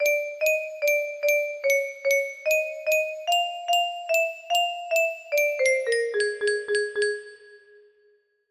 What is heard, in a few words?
music box